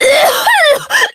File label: cough